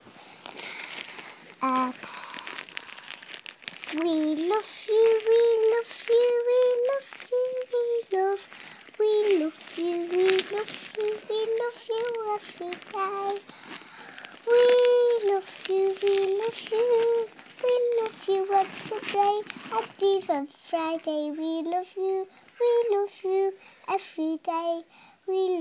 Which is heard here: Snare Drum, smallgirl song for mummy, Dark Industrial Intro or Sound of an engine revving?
smallgirl song for mummy